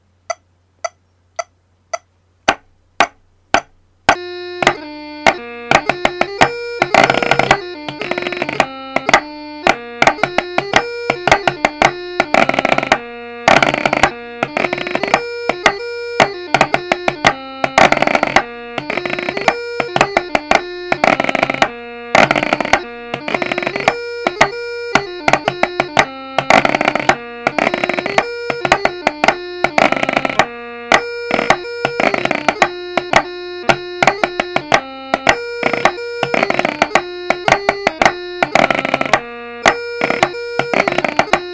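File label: Highland Fling Chanter Snare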